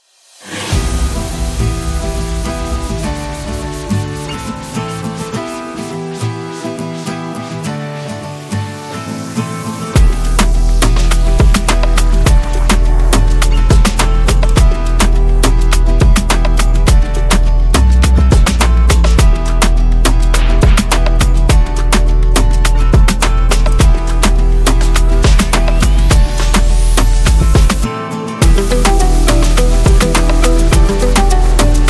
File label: Summer Walk, house